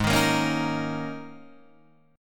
G sharp Suspended 2nd Suspended 4th G sharp Guitar DADGAD; 6,6,8,6,6,8